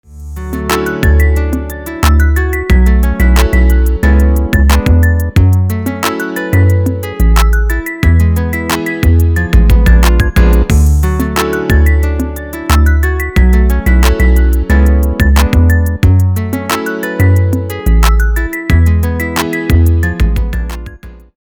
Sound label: HIP HOP THING AGAIN HAPPY